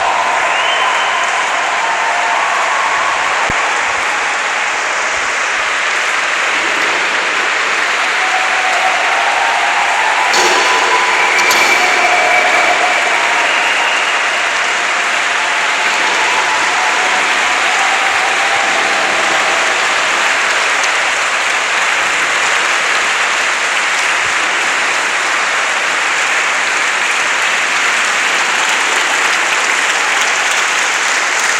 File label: press night audience applause